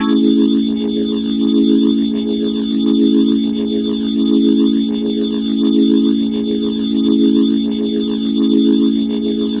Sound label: Glowing Organ A Maj; Major 7; The Vitale Organ